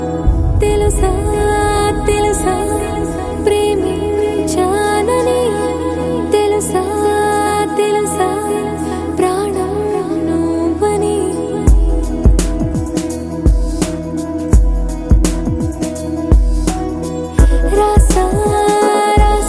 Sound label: Female Version Tone